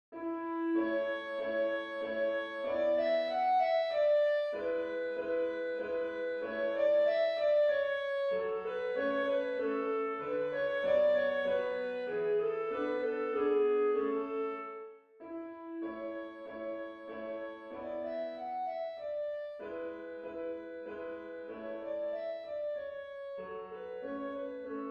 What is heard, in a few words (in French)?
contredanse majeur; beethoven ludwig van